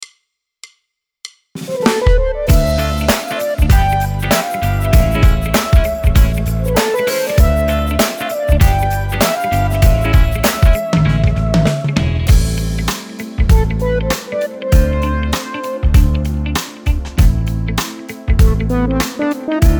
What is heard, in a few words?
Drum Funk